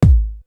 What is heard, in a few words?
KICK, Hip Hop; HipHop Kick Snr Hat; PlatinumPhattCD1; E-MU Producer Series Vol. 8 – Platinum Phatt